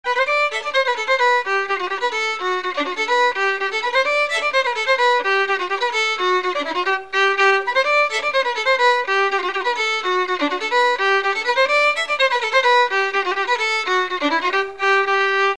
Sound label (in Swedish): polka; folklivsarkivet